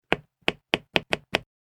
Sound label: Chopping Vegetables With Knife